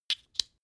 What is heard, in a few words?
noise click, signale